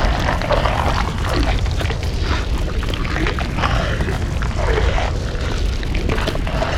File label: Tongue Grab Aim; Evolve; Behemoth